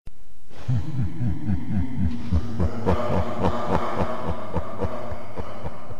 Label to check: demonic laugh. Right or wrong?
Right